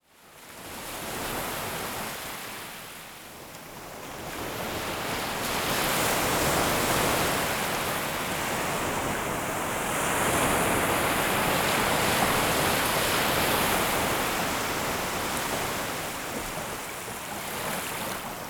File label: Sea Noises; Natural Sounds Ocean Sounds Nature Sounds